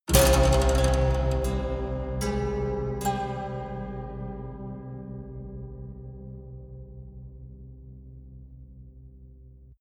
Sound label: haunted strings dark sequence